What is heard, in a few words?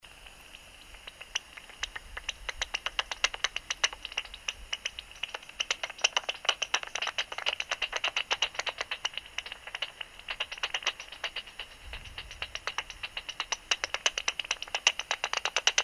bat calls